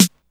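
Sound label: DDD1 snare; Hollywood Edge; The Big Bad Beatmachine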